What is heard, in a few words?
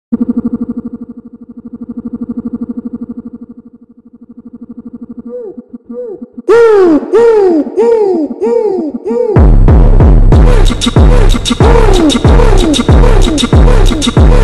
Phonk Slowed